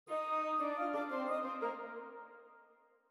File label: ORCH REDUC piano; Beethoven; Symph3; OutofContext